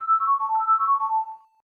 Calm Calling; Fujitsu F-42A

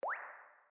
key; Huawei C5800

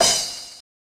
drumset, drums

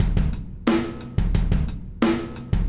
rock drums; miniBAE source code audio

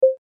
bounce

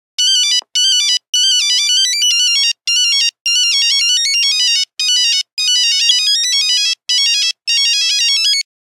Nokia Buzzer 3410 Transylvania; Windows Phone apps

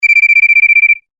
Cricket Beep, phone tones; LG GB258

